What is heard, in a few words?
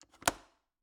Cabin, window, latch, flip, lock, open, hyper; Eiravaein Works; Vaeyan IV